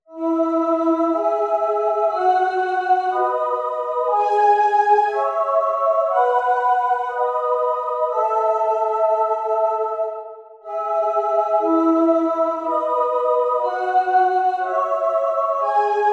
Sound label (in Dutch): vierstemmige zetting; accentparallelen en naslaande parallelen